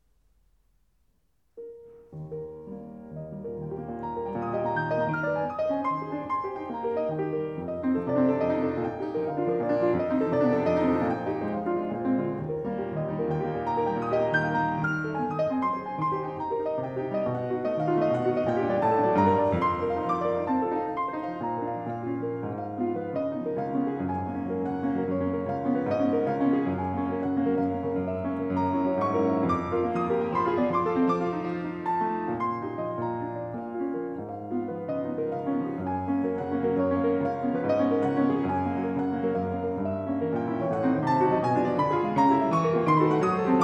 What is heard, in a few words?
Chopin Prelude E Flat Major